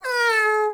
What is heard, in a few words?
Kitten Meow Playful; Enemies; Warframe; Catbrow